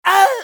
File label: scav wound; eft